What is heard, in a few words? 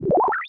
holo appear, sfx